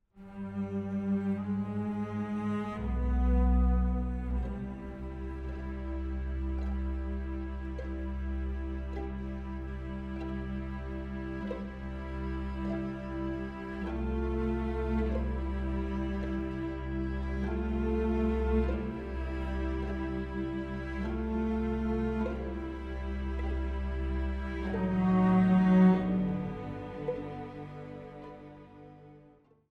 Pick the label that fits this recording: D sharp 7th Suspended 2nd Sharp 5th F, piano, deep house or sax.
piano